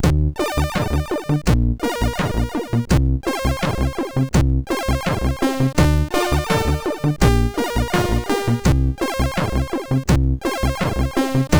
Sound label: sid MOS6581R4